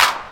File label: soft hit clap; osu